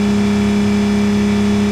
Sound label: 98 Vette Loop, Automobiles